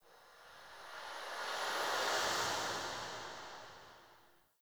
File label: Fey Eldveil Latent Random, Powers